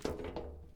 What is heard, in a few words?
walk metal; Escape from Tarkov